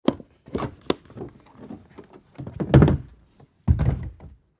Jet Ski Cover, Transportation, Watercraft, Honda, Aquatrax, Jet, Ski, Close, Engine, Seat, Cover, Remove; Boat; Blast Wave